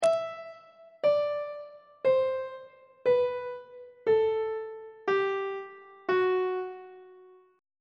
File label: g flat locrian mode descending on treble clef